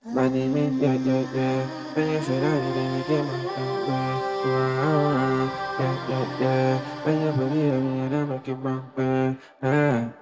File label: vocals